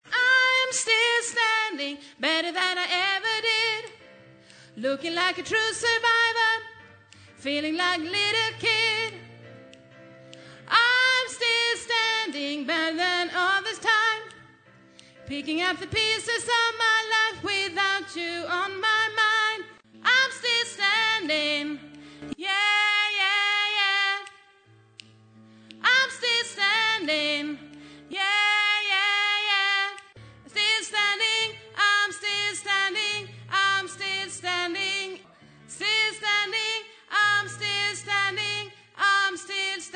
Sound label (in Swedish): sopran